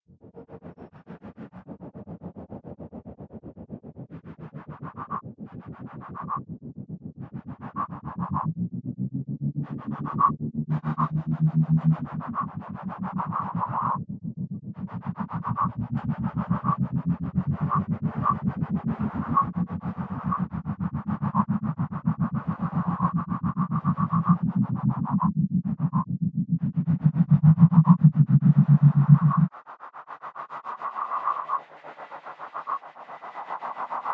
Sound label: Train Filter; Savage Beasts